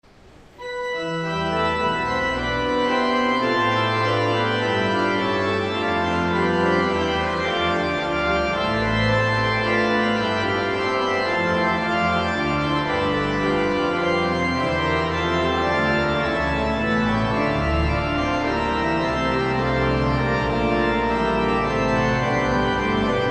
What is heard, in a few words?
Organ, Chorale Prelude; J S Bach; BWV 621